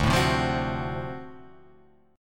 D 7th Sharp 9th D; D7#9 strummed; x,x,0,2,0,0,2,3; JGuitar

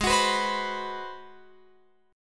Ab 7th Sharp 9th, Guitar, Open F; x,15,18,18,15,18; strummed

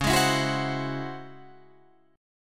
Db Minor Major 11th, Guitar, Drop D; strummed; 11,11,14,11,13,14; DbmM11

